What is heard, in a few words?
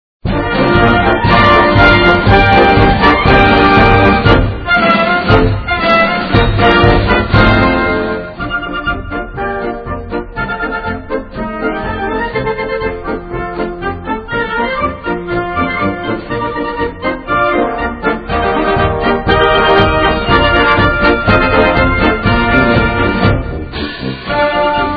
Naval Academy Band March